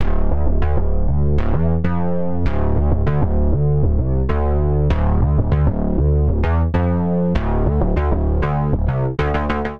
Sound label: Bass D Minor 98 bpm; Bassline; Tonal; MIDI Clips; Past; Drive and Glow; Ableton; Factory Packs